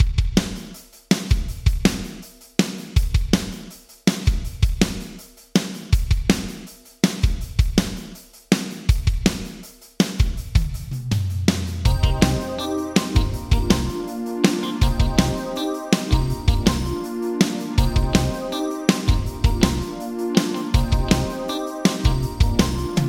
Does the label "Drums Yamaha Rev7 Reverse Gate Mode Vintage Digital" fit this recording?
Yes